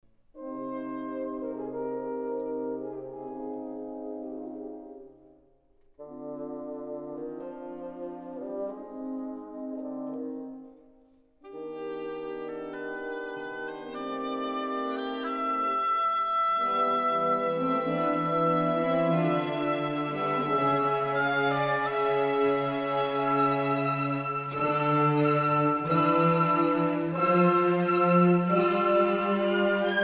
horns and trumpets

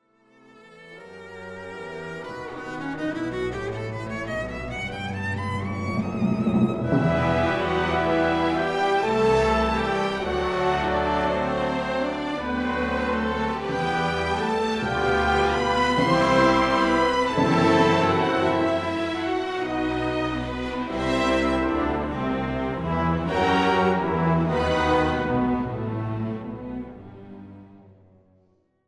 Big tutti

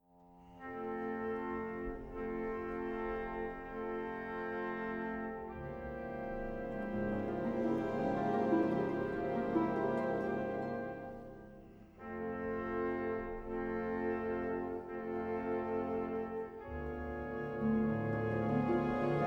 Chorale

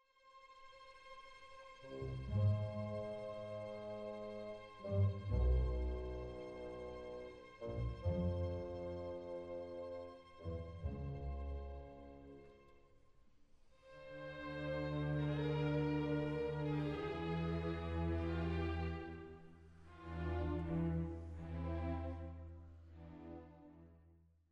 bassoons

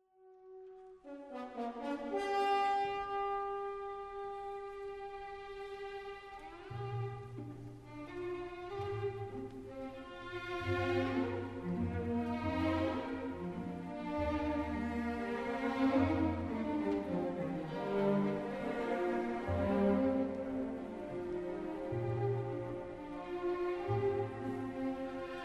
Slow Waltz; Mahler

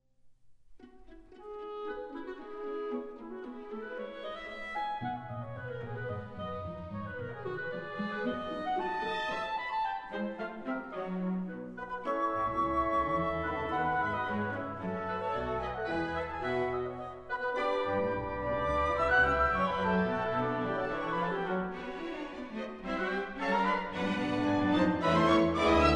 Cello Mvt 3 Playful

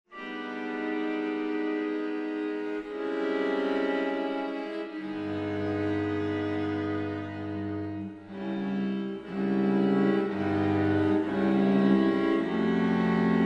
Chorale

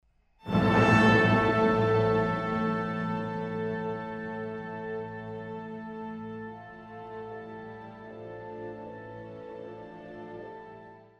First Chord

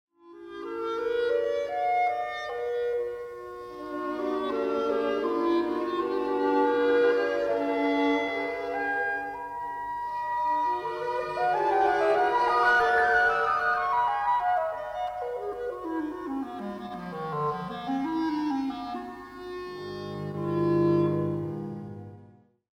florid quartet writing

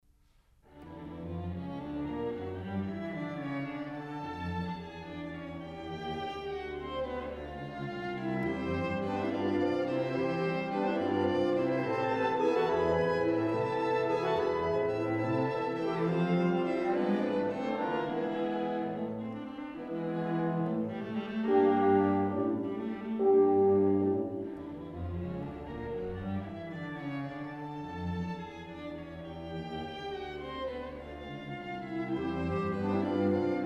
Scherzo. Allegro non troppo

